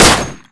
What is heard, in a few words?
pistol fire silenced, glock; glockv2